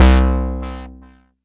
mbrute syn, Arturia MiniBrute; DRUM MACHINES

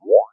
vox backwhup; Weird and Interesting Hits; single hits

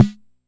Jomox MBrane, drum machines; Jomox MBrane 11

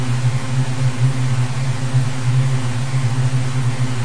hypnotize you with bees; Various Strange Sounds and Effects

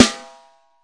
juicy snare; single hits

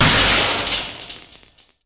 machine crash; Weird and Interesting Hits; single hits

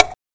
plastic hit; Weird and Interesting Hits; single hits

